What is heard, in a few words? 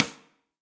Snare Sidestick Ambient Loud Punch; Drum Hits; Session Drums Studio; Standard Hits